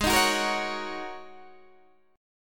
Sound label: Ab 7th Suspended 2nd Suspended 4th down Guitar Open D